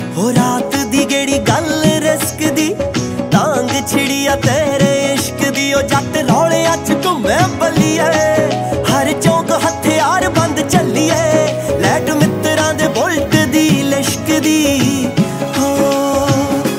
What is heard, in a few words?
Punjabi Ringtone